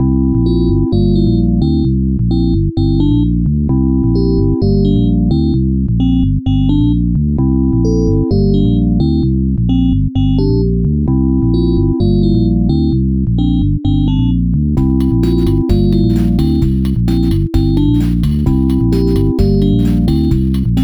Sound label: Ringtone; Caprice